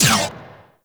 Venus Cart Turret Laser Shot; Turrets

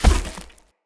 Step Vegetation, Footsteps; Enemy; Characters; Mendicant; Mnd King

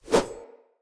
Grunt Foley Throw Whoosh; Enemy; Characters; Corrupted Sproutfolk

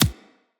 Bullet Impact Hit Notification Two; Weapons

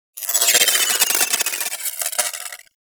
Pulse Projectile By; Warframe; Warframes; Gyre

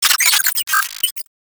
space drone enemy spotted; Enemies; Warframe; Corpus Space Drone

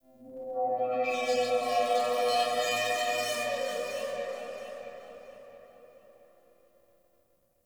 Sentient Lair Texture Interior Medium; Warframe

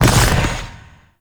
Ground Spike Burst; Enemies; Abilities; Warframe; Zariman; Void Angel

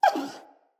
Wolf Cub Trapped Call Far; Animals; Wolf Cubs; Orengal Wolf Cub A

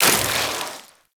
Boomerang Melee Throw; Infested

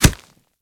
Kubrow Step Dirt, Footsteps; Enemies; Warframe

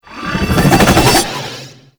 Vortex Ability Charge; Warframe; Duviri; Dax; Ashigaru; Abilities

